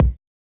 Hiphop kick; Reverb+EQ+Comp+Limit

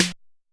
snare, snare house

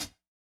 hihat; Pop; funkvol1

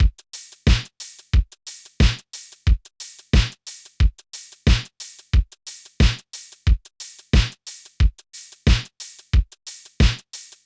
loop 90; Electro; futurefunk; futurecity

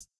hihat; Reference Beat BPM120; j city pop 1980

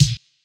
snare; Reference Beat BPM120; j city pop 1980

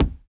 kick, house

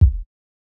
Jazz hop kick

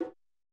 bongo, lofi hiphop; Legendary lofi hiphop

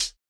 hihat, house

